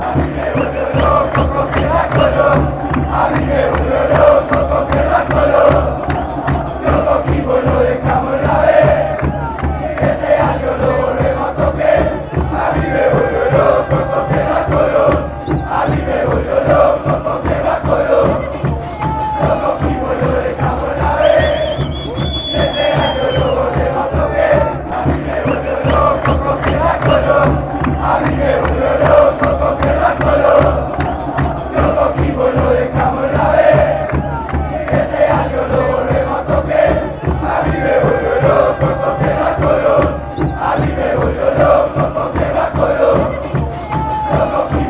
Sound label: go crazy beating colon fanchants